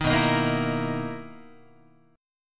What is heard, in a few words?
B-Major 11th Guitar, BM11 chord; strummed; JGuitar